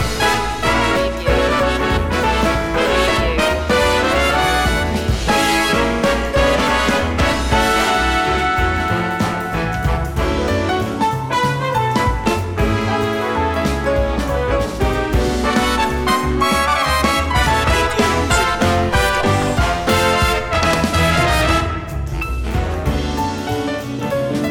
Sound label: big band jam loop